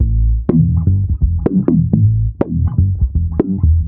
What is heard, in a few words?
IN HOUSE, A, 124 BPM, Bass; Sampleheads; Ultra Freakin' Bass